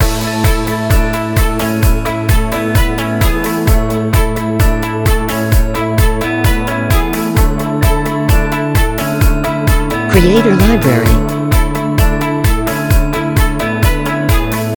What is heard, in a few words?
Inspiring Motivation, corporate music